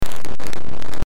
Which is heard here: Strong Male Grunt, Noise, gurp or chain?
Noise